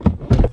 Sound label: gear; Mafie 1